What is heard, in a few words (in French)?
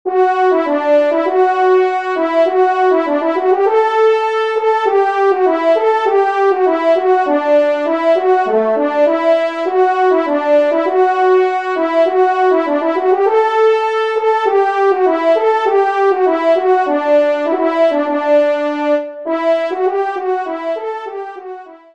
Fanfare; Loisirs du Chasseur; Pupitre 1° Cor; Henri Sénée